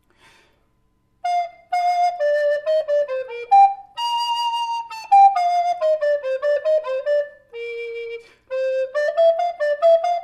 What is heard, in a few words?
Treble B, recorders